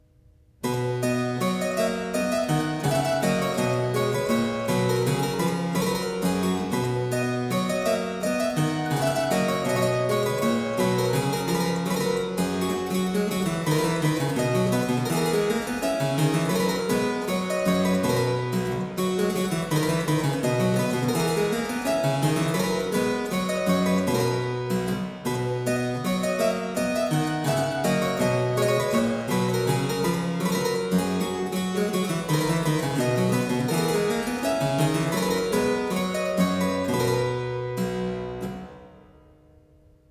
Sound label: A song tune, harpsichord; Complete Chamber Music; Brilliant Classics; Miscellaneous pieces for the harpsichord